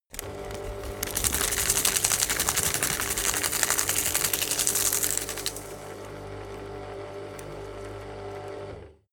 shredder shredding

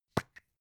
plastic spray can small open

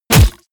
crossbow hit generic

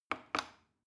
plastic button small click regular slow

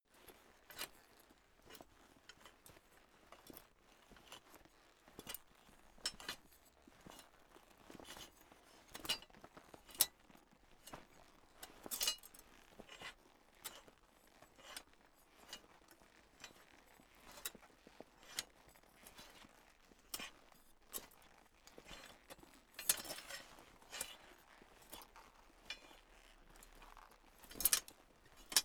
troops metal layer movement

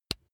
razor attachment mount

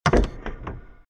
door lock

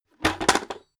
bucket drop handle ring

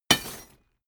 impact chain leather

